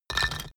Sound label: stone tile fall; natur-tiere; steine